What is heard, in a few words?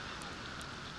Background Noise